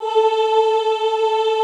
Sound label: OOH A3, Classical Choir; Best Service; Extended Classical Choir